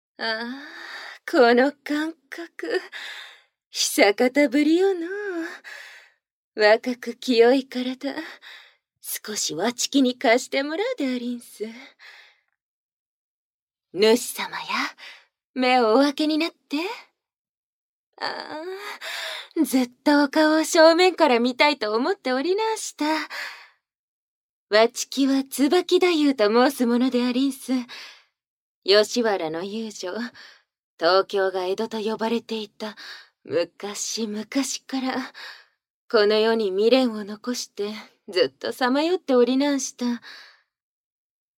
花魁の愛撫(乳首舐め)

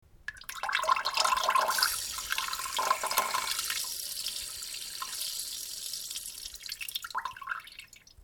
おしっこしながら押し出す感じに3回お腹の下に力をいれました

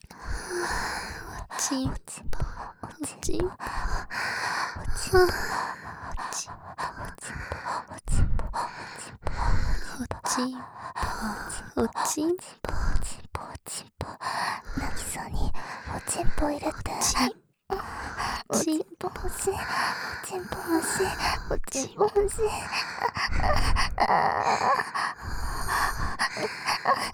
喘ぎ; お屋敷メイドのハーレム淫語ヘヴン; バイノーラルオナサポ音声

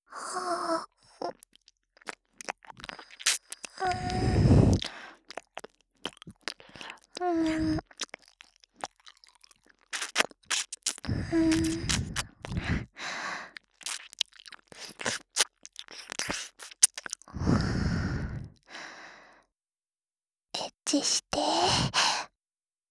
エッチしよ 耳舐め; ハーレムオナサポ 4人のメイドがご主人様のオナニーをお手伝いするバイノーラル～文、千曜、名月、莉桜～

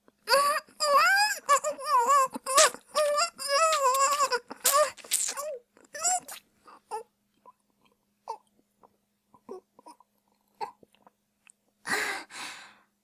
ごっくん; Honey Drop

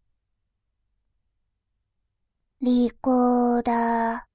リコーダー, バイノーラル右, ASMR

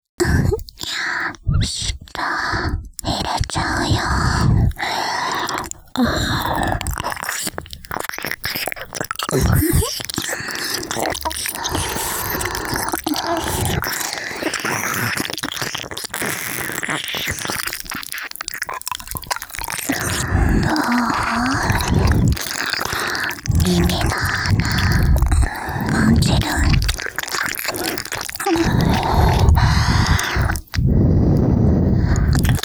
両耳舐め強リップ強,ASMR; 密室射精ゲーム～指示に背けば首輪が爆発～

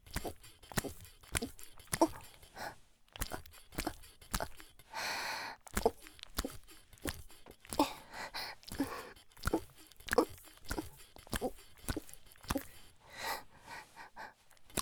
ギシアンループ; 田舎の幼馴染はからかい上手 第5話

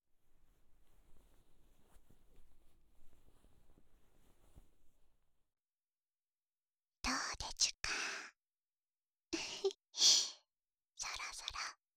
授乳＆亀頭責め＆連続射精; くちゅ音無し

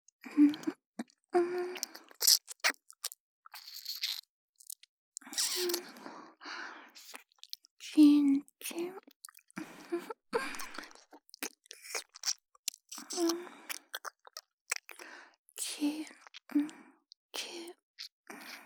キス; 名月

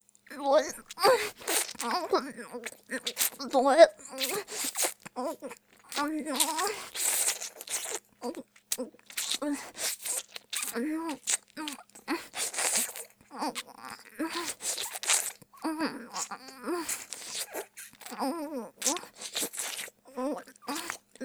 凌辱系音声素材, 激しくフェラ; Honey Drop

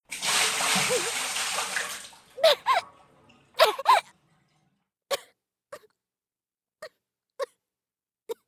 水をかける; 効果音あり

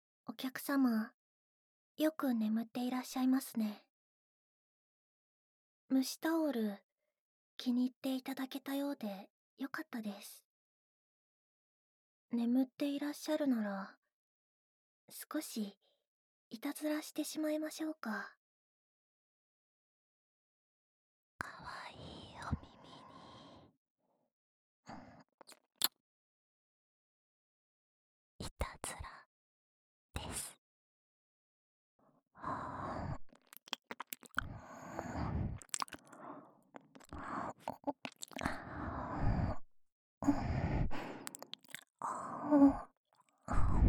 目隠し (タオル) 耳舐め,ASMR

